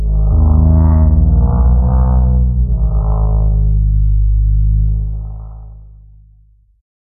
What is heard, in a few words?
Bass Part; And Yet It Moves